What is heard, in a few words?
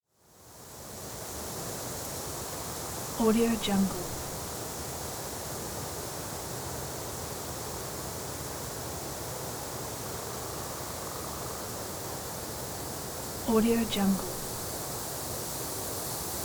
wind in grass